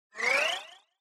Scene Change Short Stereo Pan

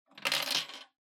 Public Phone Coin Return 10 Yen

